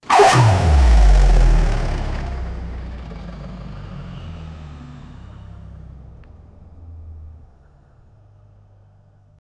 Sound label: sail boat engine on off; sound effect